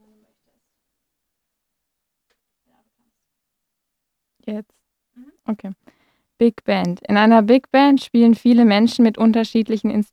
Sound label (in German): Big Band